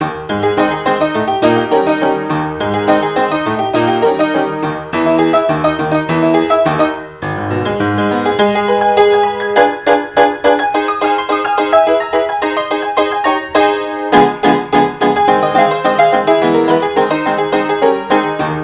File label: honky tonk